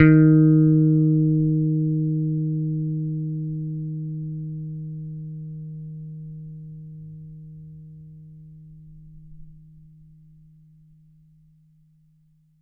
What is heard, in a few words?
T-BIRD BASS PICK D#3; Bass Mace; DirectWave